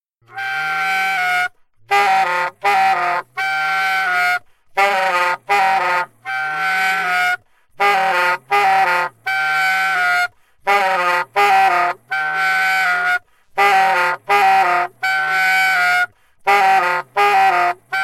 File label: altosax